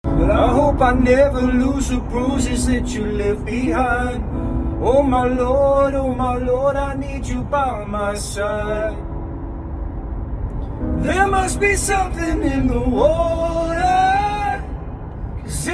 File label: Wolf